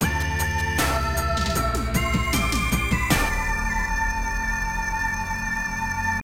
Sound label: End (Game Over); mirai ninja namco system 2 arcade gamerip 1988